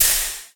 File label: coals step; Crypt of the NecroDancer